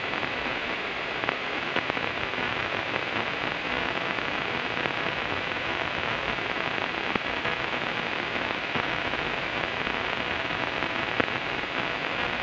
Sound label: realistic post apocalyptic